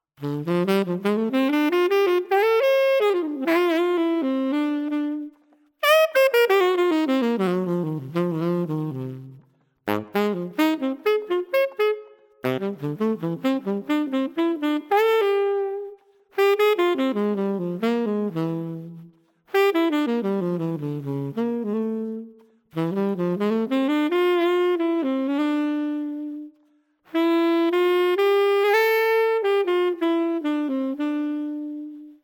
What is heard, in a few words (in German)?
pickup tenor; Einbau-Tonabnehmer für Holzblasinstrumente; Sounddemo